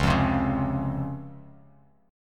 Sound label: Db Major 7th, Bass Standard; strummed; JGuitar